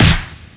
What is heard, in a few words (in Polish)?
werbel